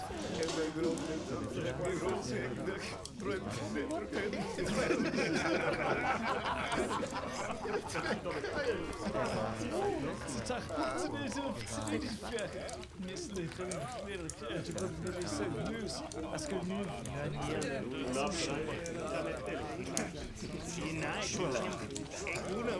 establishment, ambience; pf2e; hellbreakers

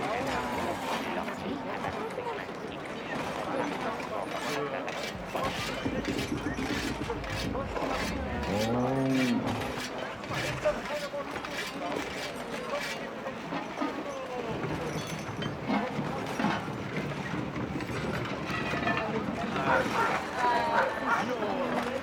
town, ambience; pf2e; hellbreakers

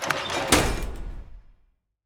open, doors jail

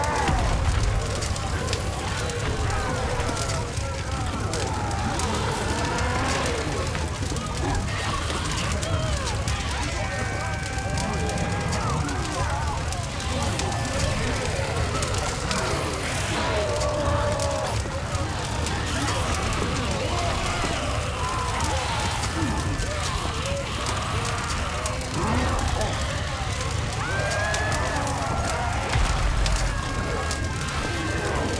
aflame; ambience; brastlewark

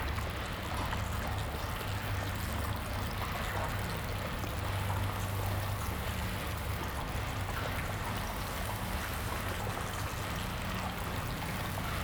barrow facility, ambience; sf2e; guilt of the grave world

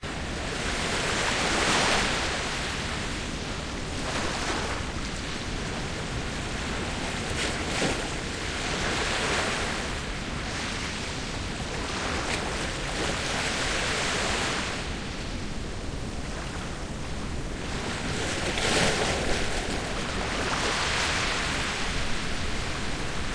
Sea Waves; fa battlemaps

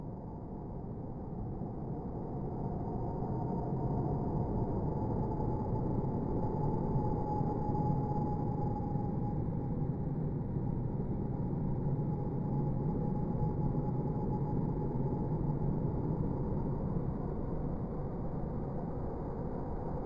barrow, ambience; sf2e; guilt of the grave world

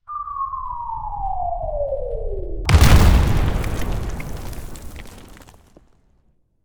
artillery impact

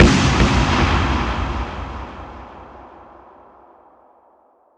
explosion

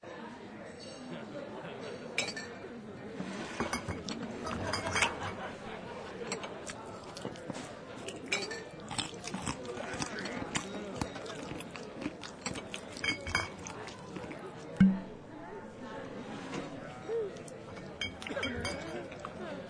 Tavern; fa battlemaps

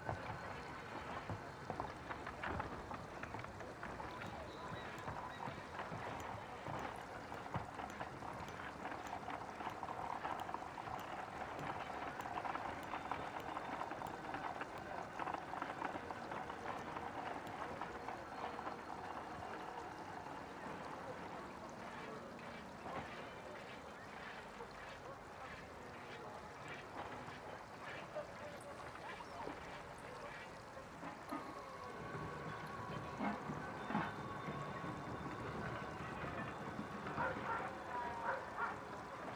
lodge, ambience; aeon

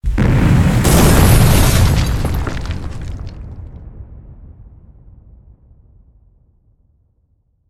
stockpile explosion

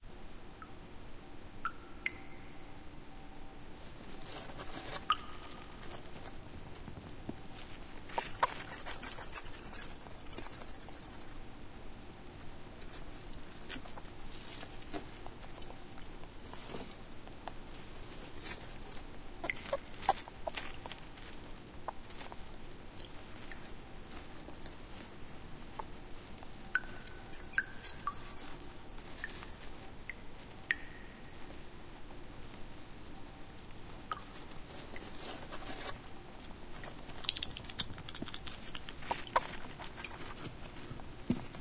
Cellar With Mice; fa battlemaps

